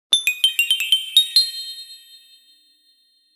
a short bright cartoony s

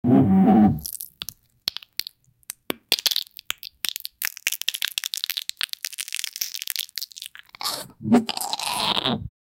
bone breaking disgusting

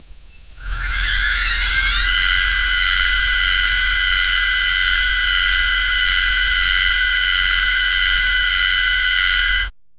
a start up intro for

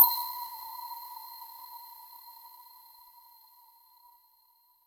subtle menu button hover

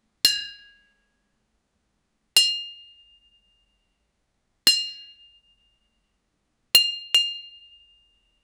wedding ring hitting on

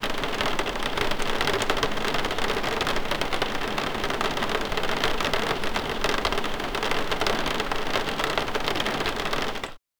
rain drops on a window

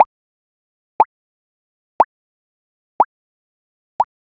short percussive sine wav

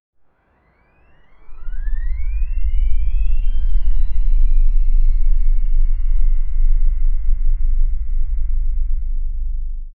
blinding charge of pure l

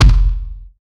short deep impact

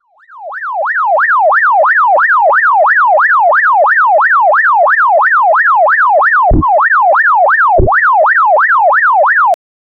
futuristic european polic, siren

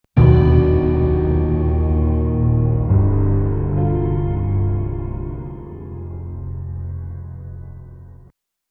dark cinematic horror mus

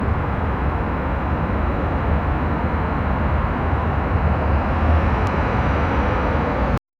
distant city noise

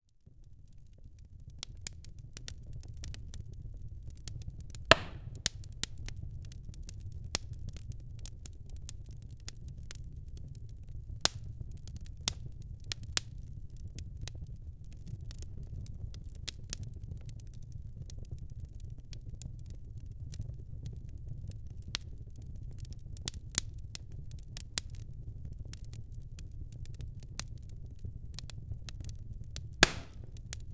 crackling fireplace

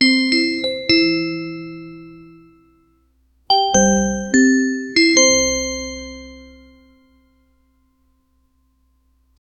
success sound for word

